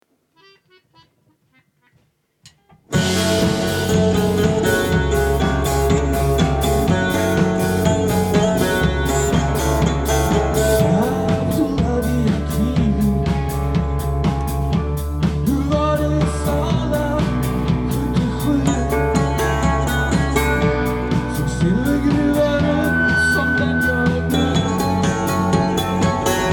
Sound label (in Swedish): ljuset saz